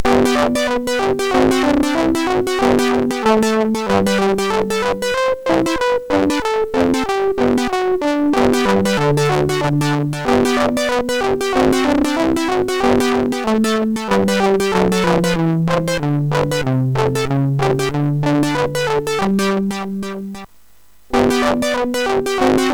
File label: Instrumental Compositions, sid MOS6581R4